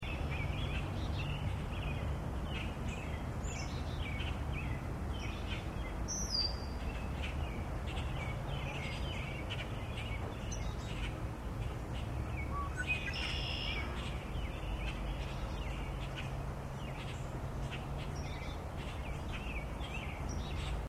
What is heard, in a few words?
marsh